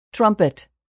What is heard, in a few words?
trumpet